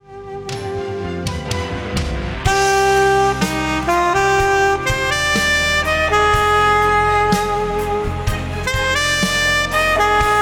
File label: alt, sax